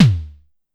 Drum X Tom, Pearl Drum-X

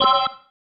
Stab, Organ, One Shots

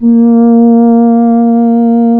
Synclav FM, WIND, A#; Big Fish Audio

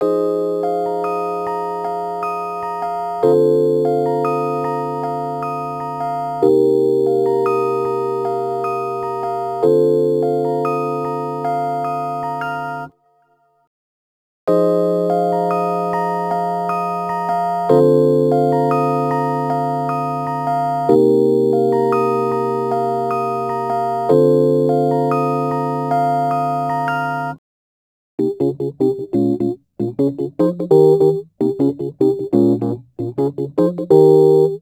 Rhodes Attacks; Ueberschall

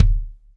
Kick, Drum & Bass; Kicks; AMG - Prototype Drum & Bass

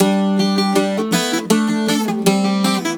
Celtic Flavours; USB Soundscan vol.42; AKAI

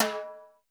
HiTimb, Roland JV 1080

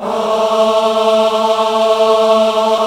AAH; AMG - Vince Clarke Lucky Bastard

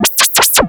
scifi, SCIFI & SWEEPS; Techno Trance Essentials

